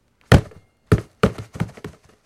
Football Heavy Hit B, football

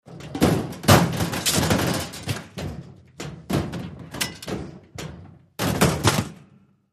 Junk Slams Metallic

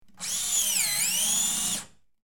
High Pitched Drill, air tool

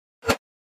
Mouse Over Short Whip

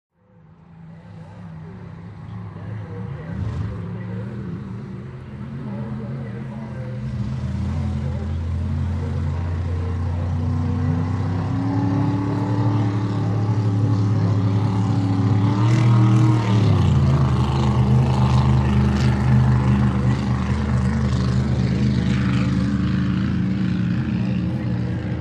Boat Racing Amb, motorboats

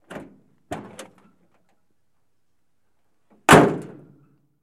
1947 Dodge Door; dodge pick up 1947

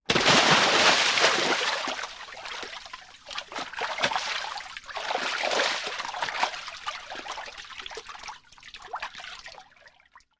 Splashes Large Splash

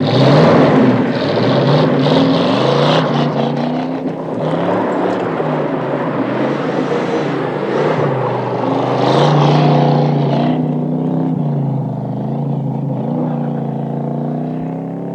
Mustang Doing Figure

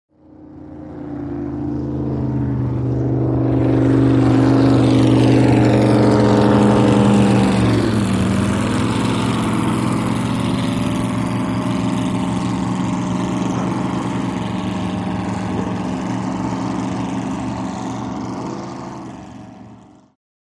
Drag Boat By Slow 2 Bo

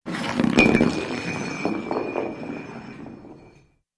Beer Bottle Slide Sin; bars & discos

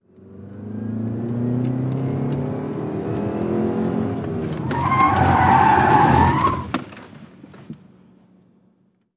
Sedan 180 Rev Skid Mx

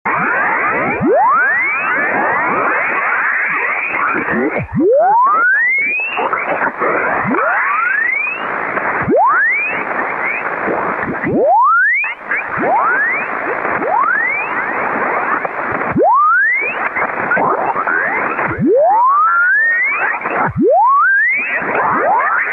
Tuning Side Band, static

